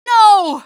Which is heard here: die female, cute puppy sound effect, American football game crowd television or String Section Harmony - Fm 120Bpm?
die female